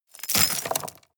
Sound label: drop stones large